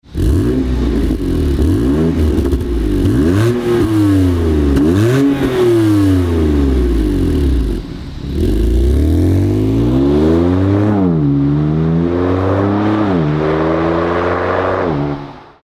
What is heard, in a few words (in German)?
BMW M2 Competition Axle-Back Klappen geschlossen; REMUS EG